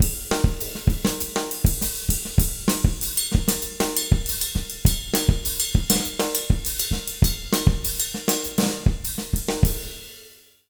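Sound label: 100 Mozambique, jazz latin drumloops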